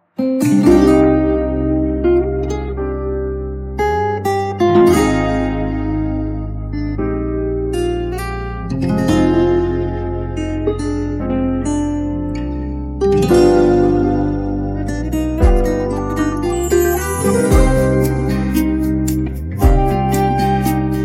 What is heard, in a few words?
Acoustic Version